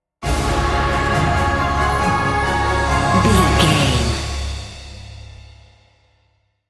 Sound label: epic emotional sting bumper